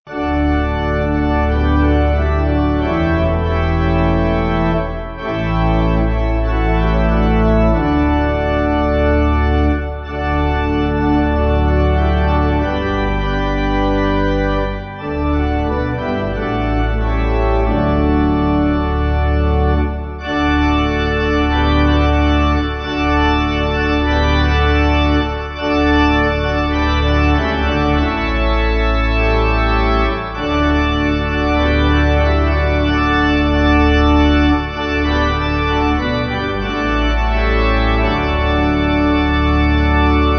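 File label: Pipe Organ, Music Backing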